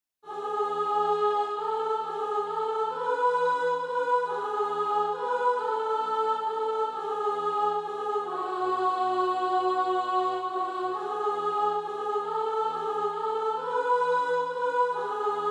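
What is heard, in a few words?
soprano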